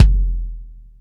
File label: BD, Bassdrums, Roland TD-20